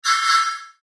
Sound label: Chime Transition Multimedia; Web Interactive; Chimes; Technology; Blastwave